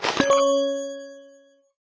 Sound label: Boxes move out